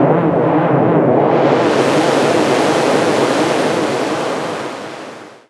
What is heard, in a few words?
Horn HiTech